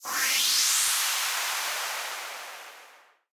dazzle Free Spin Outro Enter Transition; fortunerangers

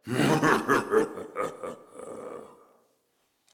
evil laugh; arcane

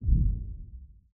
giant Reel Bounce

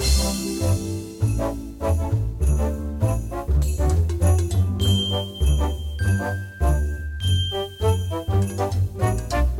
Free Spin Loop; jingle spin

